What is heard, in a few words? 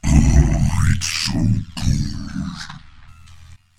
death; heatsink; heatriser